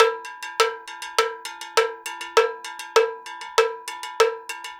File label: 100 STEEL, Percussion Loops